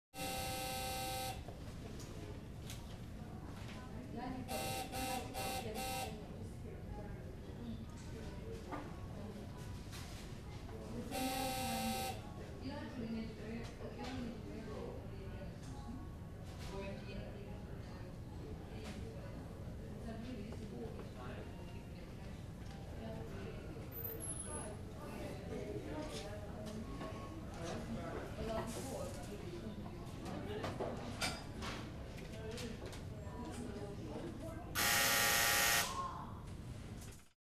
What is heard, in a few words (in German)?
Im Wartezimmer